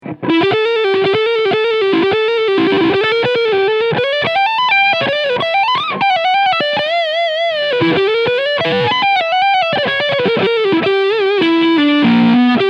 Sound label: Ibanez RGT1221PB DTF Lead Sound Front PU